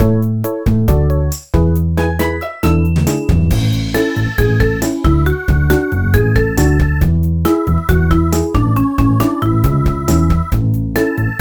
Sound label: BGM Result; Kirei Zukin Seikatsu 2; NDS